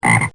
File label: frogs